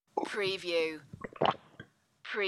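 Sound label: Sip Swallow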